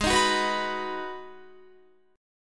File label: Ab Minor 7th down Guitar; Abm7; strummed